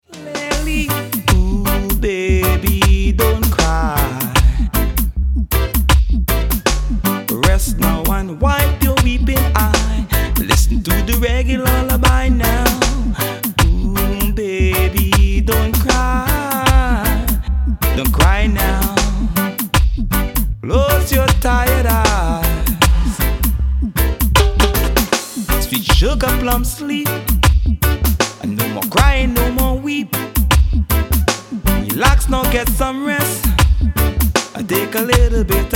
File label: reggae lullaby